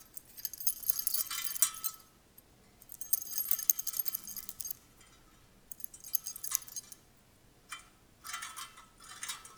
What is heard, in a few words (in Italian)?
rumori catene; Inquisitor 3